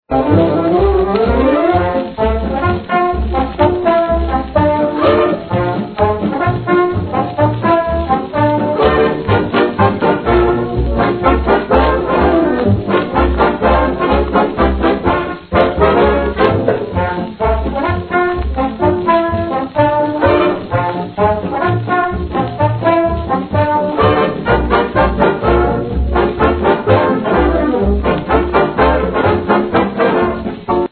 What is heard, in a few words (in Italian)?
Orchestra